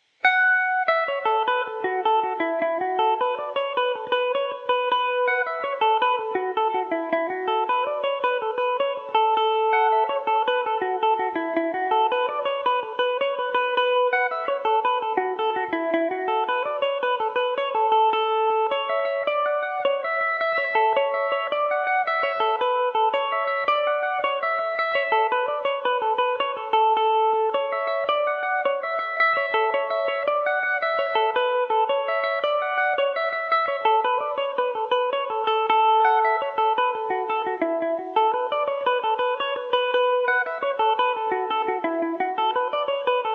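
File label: A major, Irish mandolin; health to the ladies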